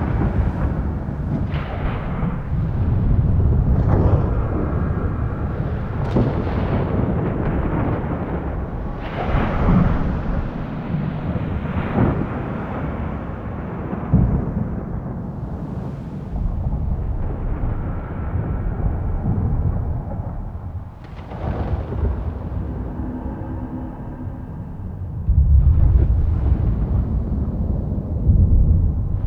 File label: Exterior Bed Loop Large; Warframe; Sentient Devourer; Ambience